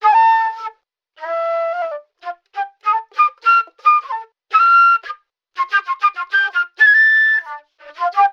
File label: flute funky sadness, Loops Phonky